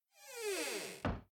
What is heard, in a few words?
Hiding Spot Enter; The Town